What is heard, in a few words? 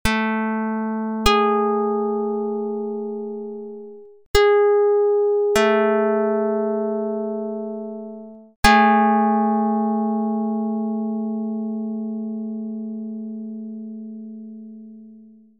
pluck; 15/8; dr220